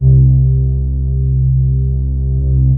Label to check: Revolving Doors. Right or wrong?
Wrong